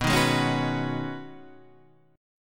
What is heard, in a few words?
B 6th Add 9th B down Guitar Half Step Down